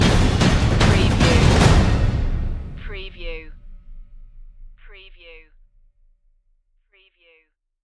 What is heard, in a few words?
toms of tension stinger